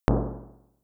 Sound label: drum